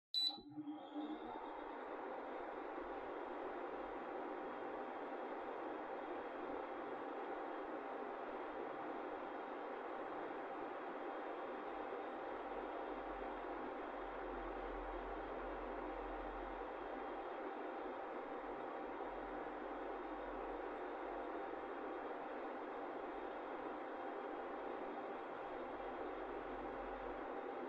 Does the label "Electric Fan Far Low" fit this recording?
Yes